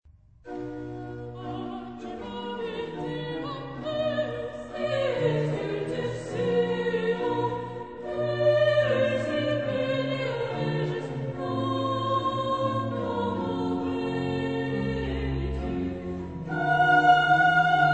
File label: Alleluia, orgue; Charpentier